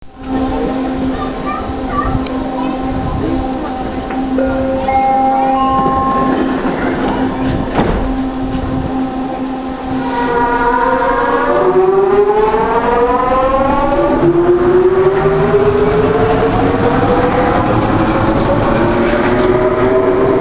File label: leaving, bus